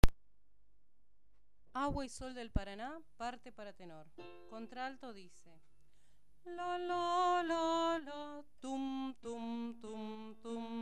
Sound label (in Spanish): Tenores